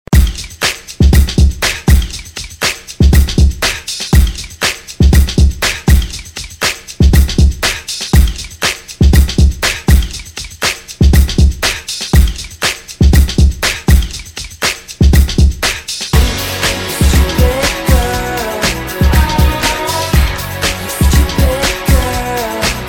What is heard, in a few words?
Dj Intro Outro 90s Hook